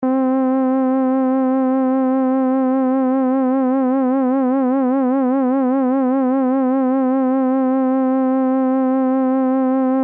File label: basic vib